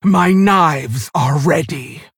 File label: female orc attack